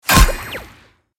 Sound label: Robot punch impact; robotic; gfxsounds